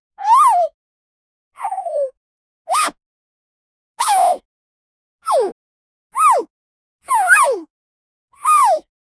short high pitched cartoo; climbing

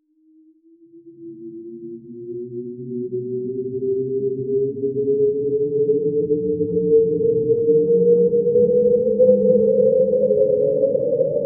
void logo sounds; branding

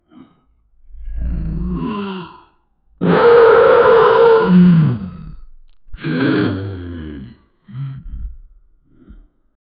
heavy animal breathing, bear; heavy animal breathing fr

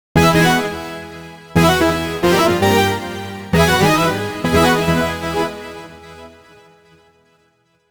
10 note 8 bit echoing; robotic

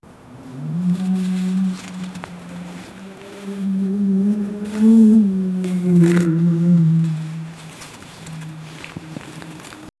large terrifying beast wa, monsters

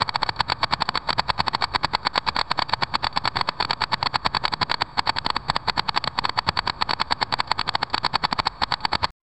create an suspense loo

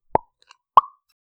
pop of a cork being, bottle

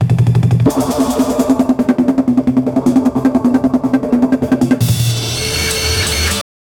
podcast transition jazz

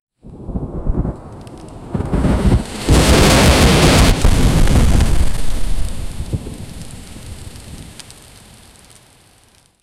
quick whoosh of wind then, fight

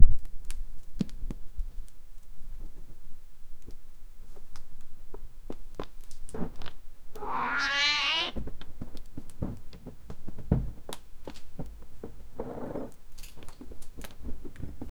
the sound of a small, accidental

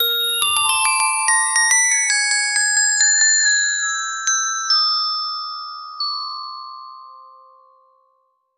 xylophone downward run

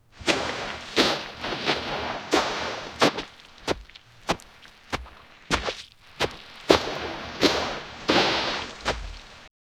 foley 78kg male runs, sand

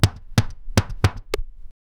the sound of the ball, gameplay

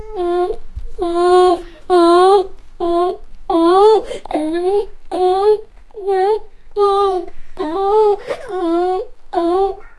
girls exercise sound and

